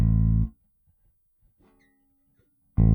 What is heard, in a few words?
E Bass